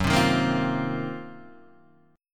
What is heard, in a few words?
D sharp Minor 6th Add 9th F sharp